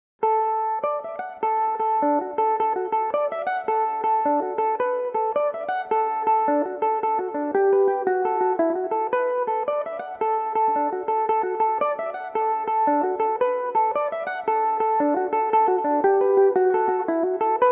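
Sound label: D major belmuse, irish mandolin; The Humours of Glynn (jig in D Major); Belmuse EM-190 electric mandolin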